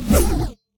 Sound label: swing; smite; poseidon